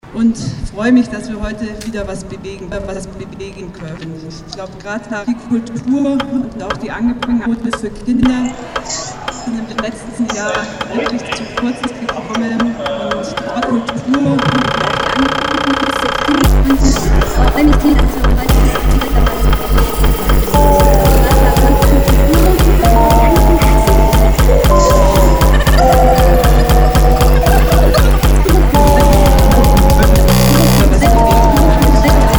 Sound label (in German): intro gabba vers